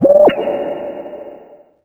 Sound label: nav signal